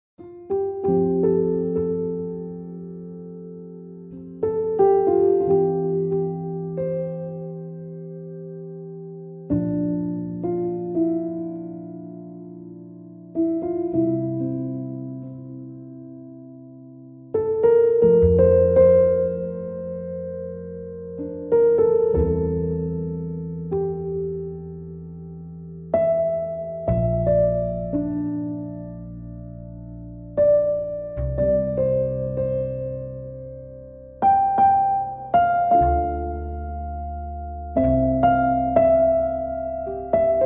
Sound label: 감성